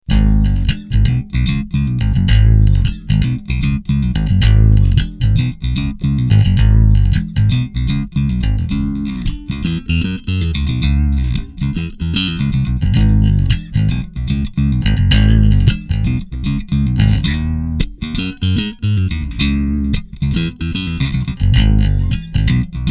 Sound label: riff